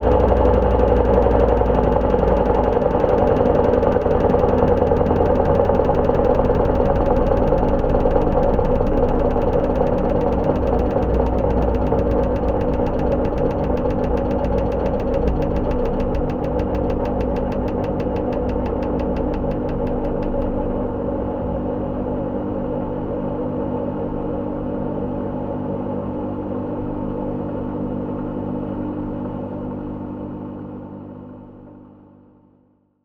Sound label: Washing Machine, contact mic, mechanical